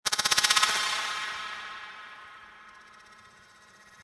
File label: Digital Glitch Burst